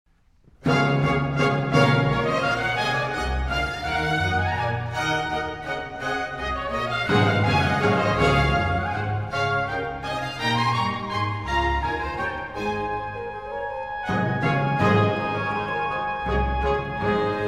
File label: cantata chorus; bach christmas oratorio; cantata iii